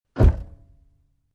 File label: Closing Trunk